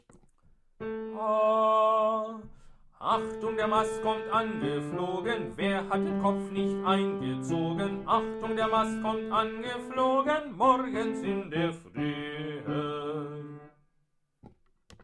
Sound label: Drunken Sailor Bass; gesangverein wollbach